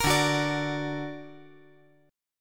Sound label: D Augmented, chord